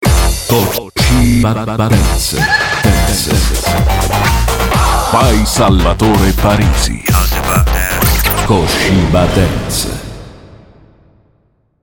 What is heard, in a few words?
dance